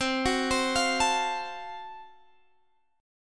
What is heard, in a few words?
Gb Minor Major 7th Flat 5th, chord